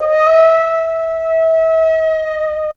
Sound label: FLUTE